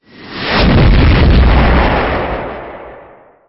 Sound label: Explosion